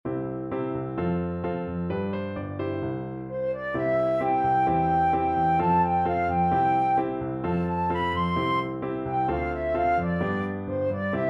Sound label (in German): Playback C-Dur